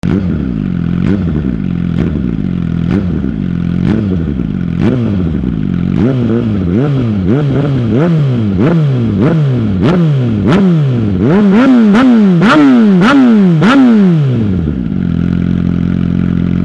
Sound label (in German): Sound GSX-R1000 GPC-RSII, Auspuffsystem; Original Schalldämpfer 3,25 kg